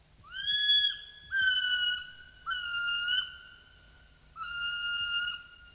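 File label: kiwi brown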